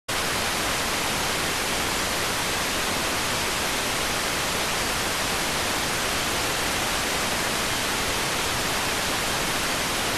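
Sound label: radioteletype receive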